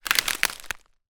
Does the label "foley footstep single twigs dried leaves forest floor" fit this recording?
Yes